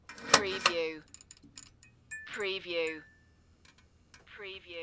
Lamp Chain Pull Switch Flourescent Light Clinks On